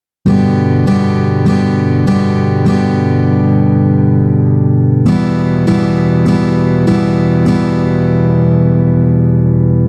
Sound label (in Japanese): 4分音符, ギター; ギター講師が教える1日で弾ける初心者用ギター